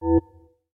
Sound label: rollover